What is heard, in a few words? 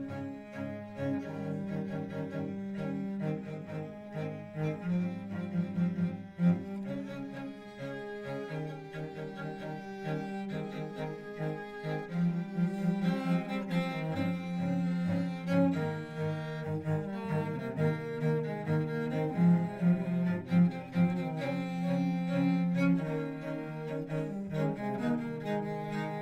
Cello Ensemble